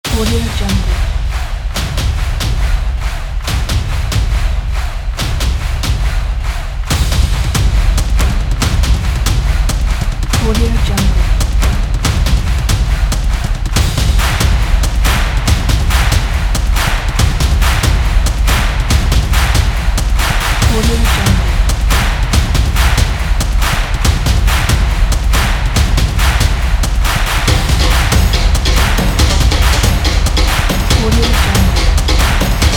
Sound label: sport loop